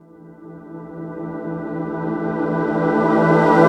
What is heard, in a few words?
Rev Pad; Zero-G Jungle Warfare 1, 2 & 3; 90 s Sample CDs